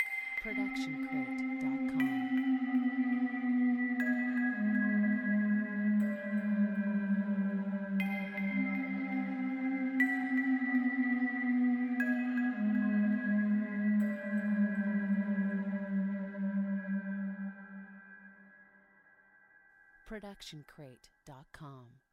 haunting bell melody

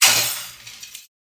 Glass Break; SoundsCrate

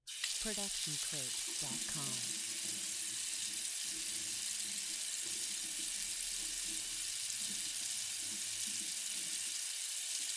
Sink On and Off; SoundsCrate

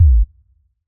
electronic kick short

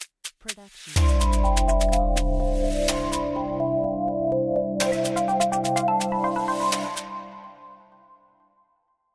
modern news logo; soundscrate

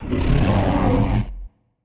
velociraptor; soundscrate

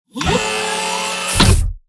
Robotic Movement; SoundsCrate

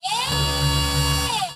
Robotic Motor; SoundsCrate

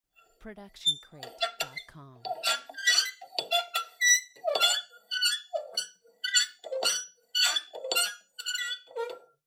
Squeaking; SoundsCrate

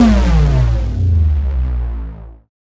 Bass Drop; SoundsCrate

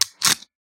old camera sounds; soundscrate

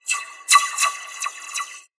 sci fi weapon blaster accent multiple; soundscrate

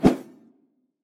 arrow swoosh single fast; SoundsCrate

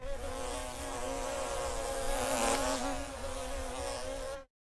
bees

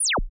TV Turn Off Frequency Drop; SoundsCrate

